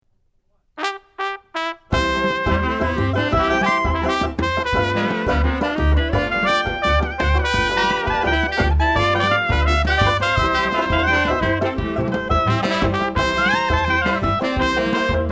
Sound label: Banjo a la Creole